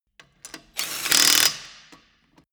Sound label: impact wrench screw fast single